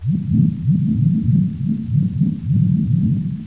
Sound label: big lava; ambience